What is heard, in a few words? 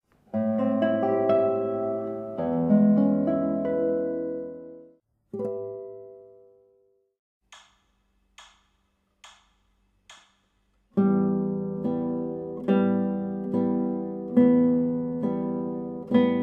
Rocking Strings